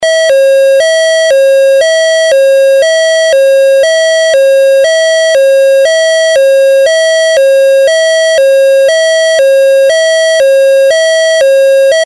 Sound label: Hi Low